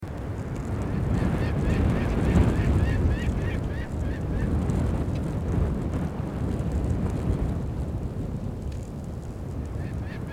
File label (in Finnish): tuuli ja tunturihaukka; ahma feature